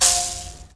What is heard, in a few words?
MOUTH BOW LO, Heart of Africa